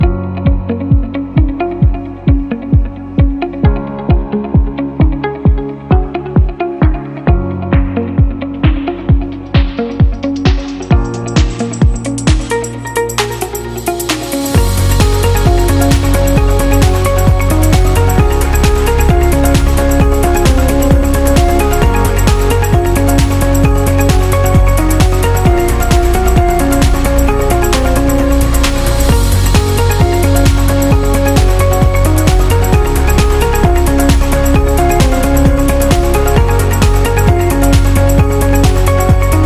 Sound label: Business Background